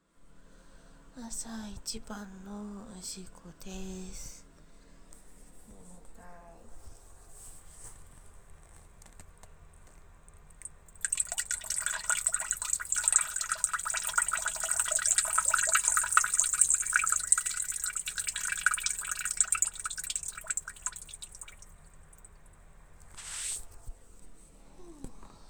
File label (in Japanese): 朝のトイレでオシッコ！; ４日目・本日最初の; 実演音声